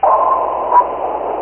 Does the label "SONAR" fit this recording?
Yes